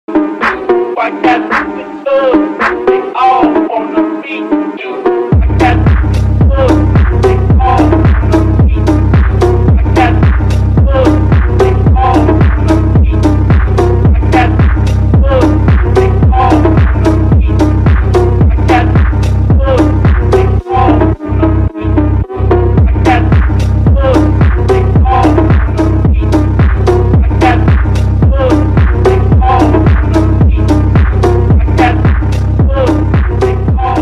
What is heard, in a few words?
Phonk